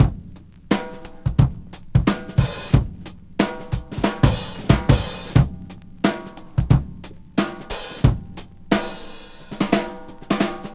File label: 89 bpm breakbeat sample b key